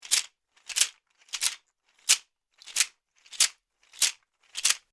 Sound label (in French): son Cistre; Percu; Duperrex